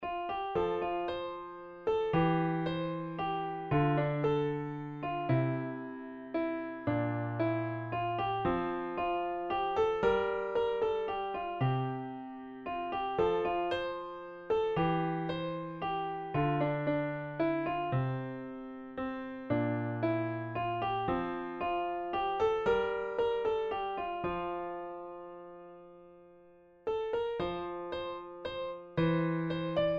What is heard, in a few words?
Piano Midi